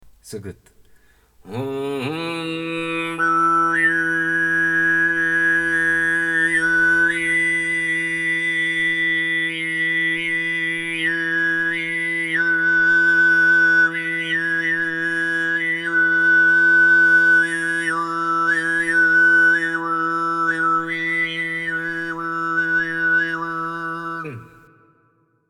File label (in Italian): audioesempi sygyt